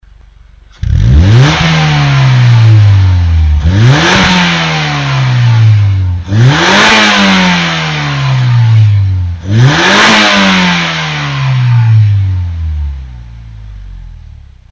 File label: Mazda6 Atenza GH, Muffler; AutoExe